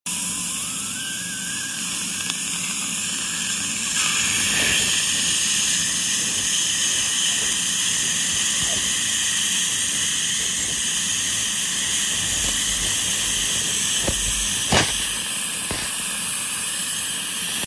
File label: Tooth polishing; پولیش دندان